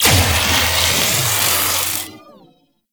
Energy Leech Activate; Eximus

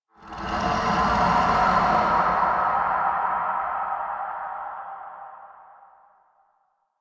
Distant Howl; Warframe; Sacrifice quest; Umbra

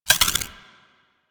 Freeze Ray Mech Chamber; Warframe; Weapons; Corpus

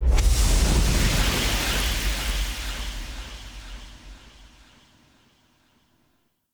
Puzzle Mural Heal; Puzzles; Circade

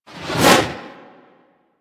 Leap Whoosh; Warframe; Venus; Venus Terra Moa; Abilities

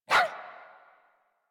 Puppy Idle Bark; Warframe; Kubrow

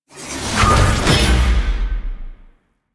Simple Teleport; Enemies; Warframe; Pax Duviricus

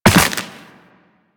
Land Soft Ice, Footsteps; Warframe; Operator

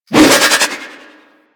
Pet Spin Whoosh; Foley

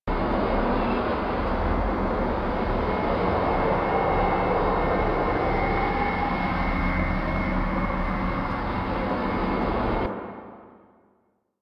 Landscape Orb Crater Loop; Warframe; Ambience; Venus Open World; Positional Stereo Loops

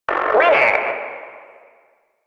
Arcade Voices; Warframe; Ambience; Nineteen Ninety Nine

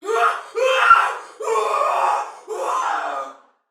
Death Fire, Female, Soldier; Dialog; Barks; Battle; Sinecure

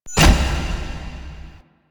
Stairs; Warframe; Entrati; Vaults

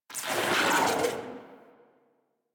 shock rifle tail; Warframe; Weapons; Corpus

